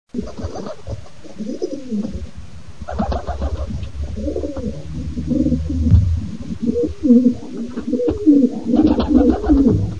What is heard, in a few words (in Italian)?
PICCIONE, birdsound